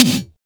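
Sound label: SDS V SNR, snare; Best Service; Real Mega Drums VOL-1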